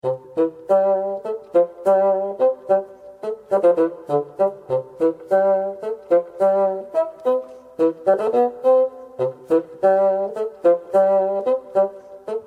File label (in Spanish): Fagot; honduras musical 7mo grado